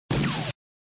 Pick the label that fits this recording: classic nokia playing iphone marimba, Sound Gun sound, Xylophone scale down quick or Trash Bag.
Sound Gun sound